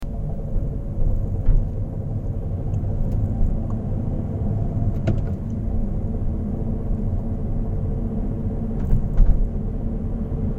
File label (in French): bruit